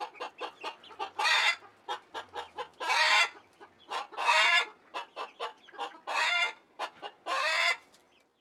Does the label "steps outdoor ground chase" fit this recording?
No